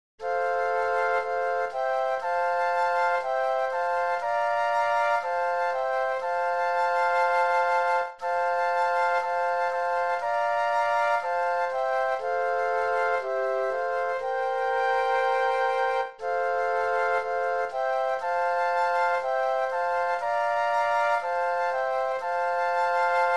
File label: Flute Trio